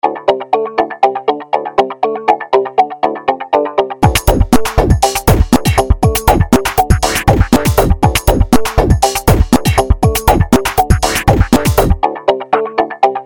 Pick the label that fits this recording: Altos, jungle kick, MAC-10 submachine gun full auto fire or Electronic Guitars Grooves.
Electronic Guitars Grooves